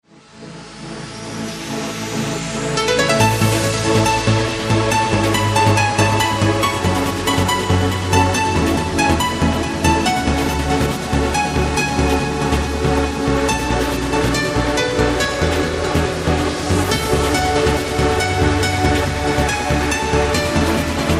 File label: Trance Klaviersonate